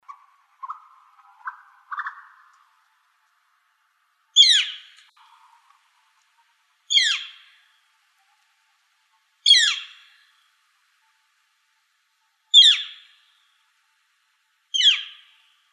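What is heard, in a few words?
Gilded Flicker calls, Woodpeckers; GIFL; deviche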